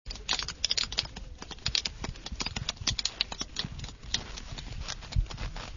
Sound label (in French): texture fixation